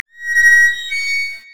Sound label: brazier chains groan